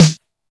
Snare, Yamaha DD-20

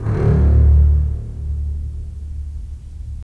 STR, STR Full Short; Roland - LA Composer vol. 4

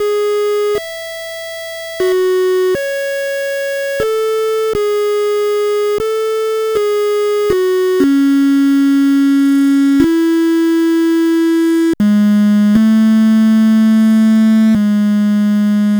Lead Melody, Chillout; Sonic Foundry (Sony Creative Software); Afterhours EDM; Chillout Service

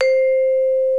MARIMBA VIBES

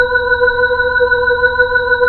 B-3 Chorus

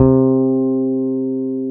FEND P-BASS C3

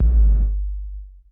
GT K808, GATE

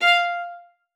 VIOLA SPICC F5; Xsample VOL-5

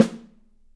Studio Snare; 3D Percussives; Studio Snare 01

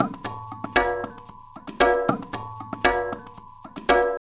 tabla, 115 bpm; Zero-G; Pure Tabla

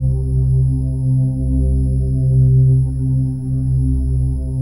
PHASE PAD; Best Service; XX Large Pads

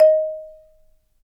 Marimba; Roland LCDP11; Africa VOL-1; Af.Marimbas

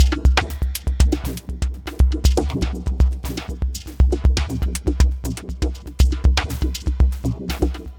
Terrestrial Hybrid, Processed Percussion

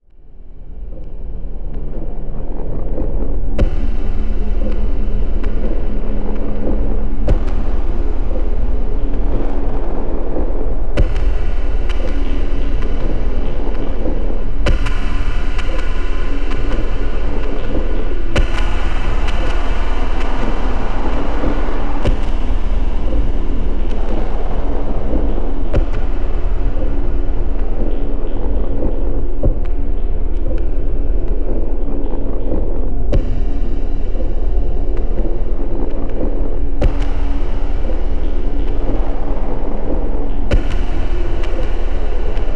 Fractured World; Satellite Hive

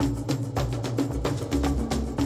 Classic DrumLoops, 110

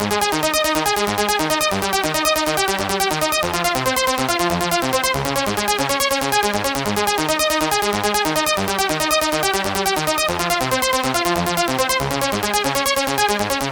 140BPM G#; Vengeance - Essential Clubsounds Vol.4

